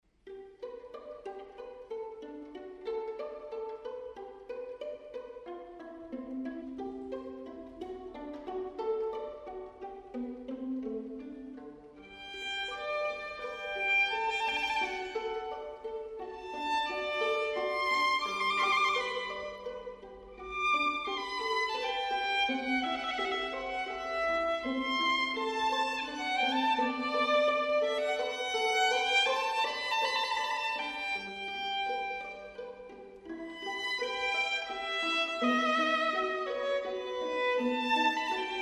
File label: Concerto for violin in G major; Antonio Vivaldi